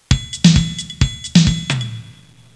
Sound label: Drum